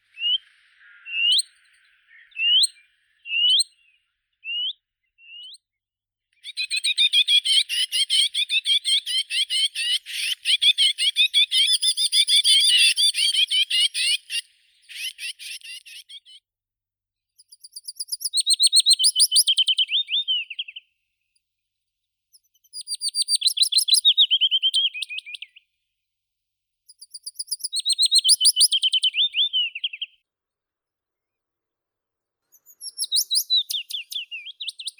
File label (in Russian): penochka vesnichka phylloscopus trochilus; zvuki prirodi